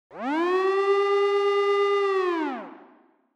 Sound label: Warning Siren Slow Single